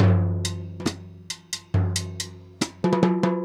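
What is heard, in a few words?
timbale cows, latin, 140bpm; Sound Effect Library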